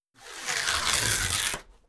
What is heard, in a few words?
Tape roller unrolling; Отрывание скотча.; Tестовые сигналы и звуки.